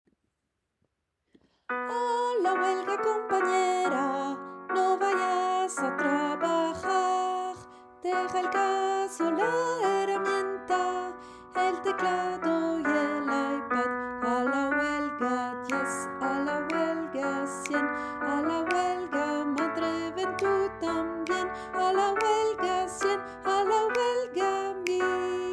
VOIX Soprano; chorale; LA CHORALE ANARCHISTE

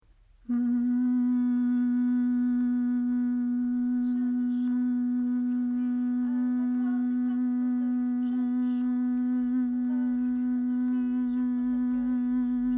VOIX Alto; chorale; LA CHORALE ANARCHISTE

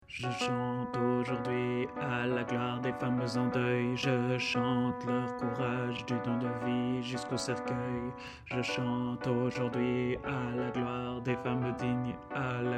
VOIX À la gloire des femmes en deuil Basse; LA CHORALE ANARCHISTE

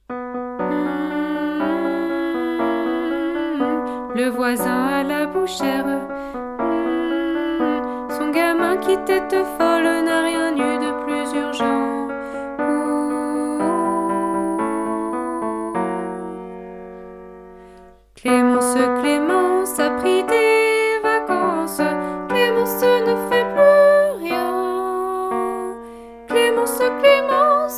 VOIX Soprano; LA CHORALE ANARCHISTE

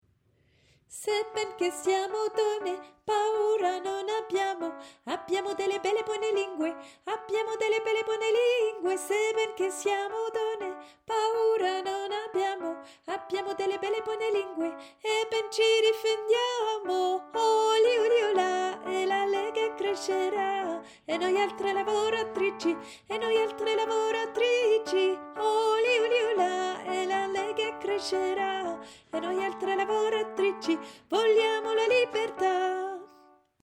VOIX Soprano; chorale; LA CHORALE ANARCHISTE

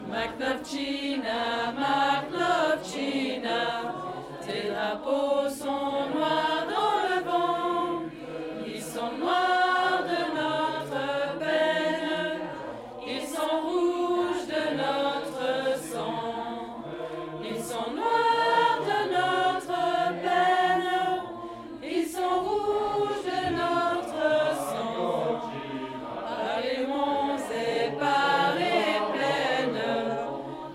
Voix Soprano

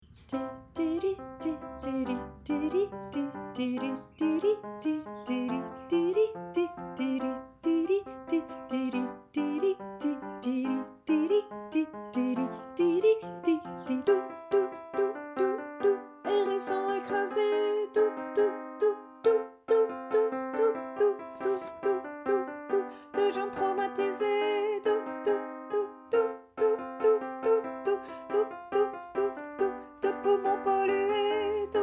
VOIX Soprano; chorale; LA CHORALE ANARCHISTE

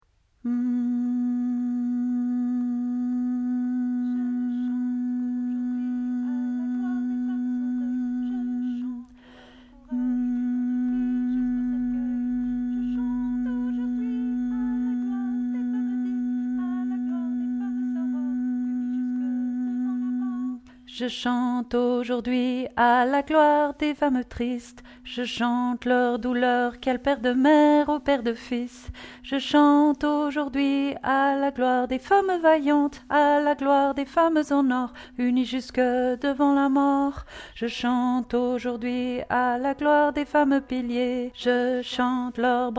VOIX Mezzo; chorale; LA CHORALE ANARCHISTE

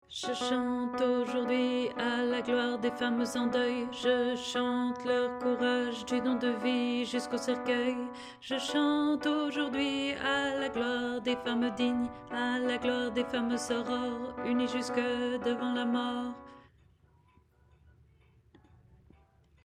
VOIX À la gloire des femmes en deuil Tenor; LA CHORALE ANARCHISTE

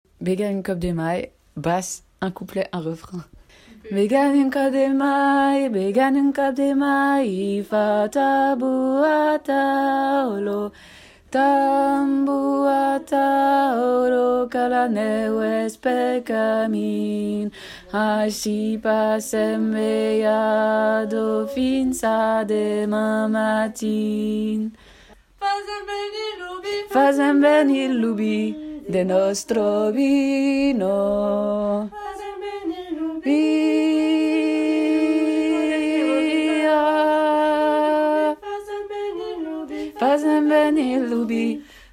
VOIX Basse; LA CHORALE ANARCHISTE

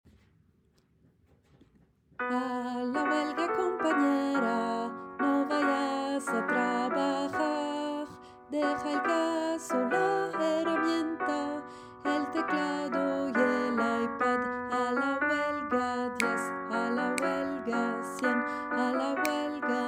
VOIX Mezzo, chorale; LA CHORALE ANARCHISTE

